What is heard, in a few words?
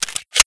pistol mag in